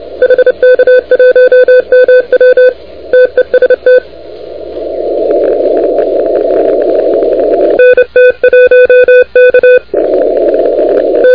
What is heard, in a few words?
CW, 28MHz; HK1MW de NT1K; ARRL10M